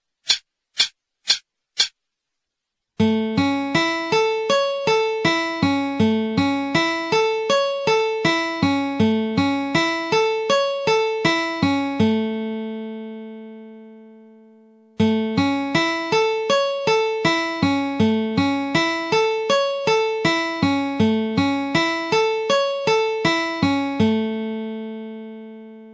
Sweep Picking, 80, guitar

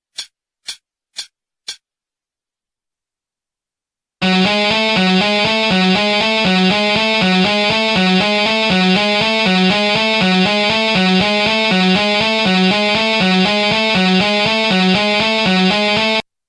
beginner solo, guitar

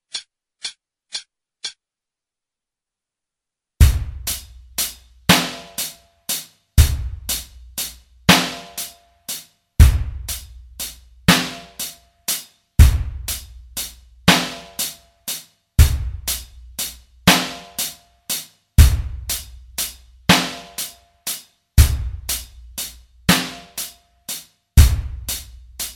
moveable arpeggios backing, 40, guitar

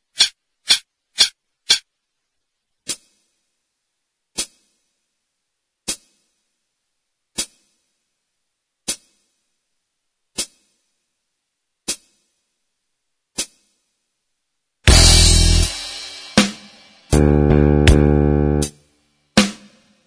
backing, 40

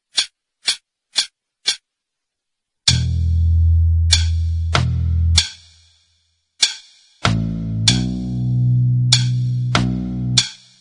Backing 48

